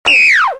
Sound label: Laser